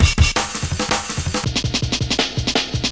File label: 165 bpm, drum loops and breaks; 161 to 180 bpm